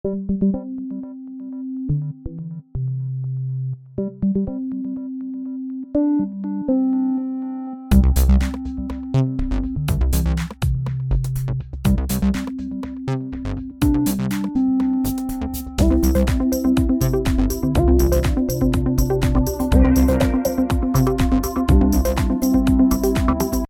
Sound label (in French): Sous marin Ringtone